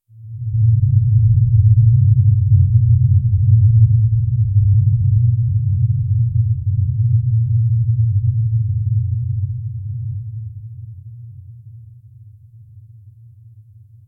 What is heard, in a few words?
calm infernal ambience de, lava